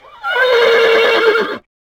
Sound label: Horse